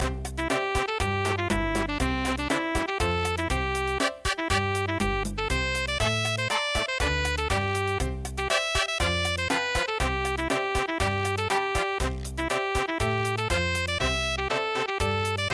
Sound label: cha cha cha; rabit dance